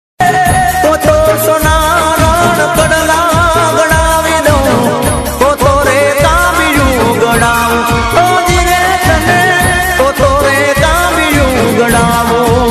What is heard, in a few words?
gujarati ringtone